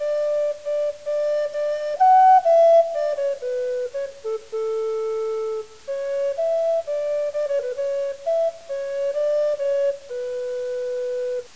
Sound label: Gemshorn